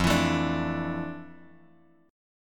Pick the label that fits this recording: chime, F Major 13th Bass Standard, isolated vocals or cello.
F Major 13th Bass Standard